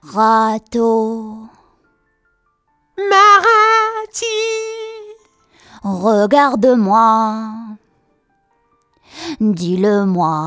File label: SLOW; MOBICLIC 57